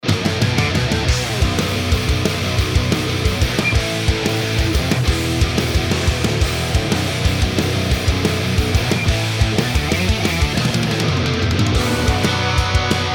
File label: MIX REAL